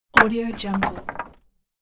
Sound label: long wooden strip noise; industrial sounds; افتادن الوار بلند چوب